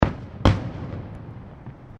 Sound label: Fireworks Multiple Firecrackers